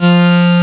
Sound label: AN SYN, SYN & LEAD; SAMPLE WAVE MIXING 2